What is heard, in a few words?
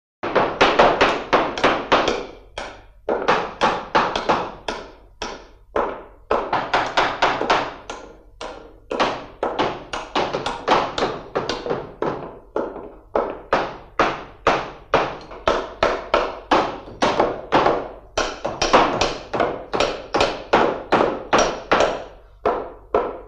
Workshop Atmos Knock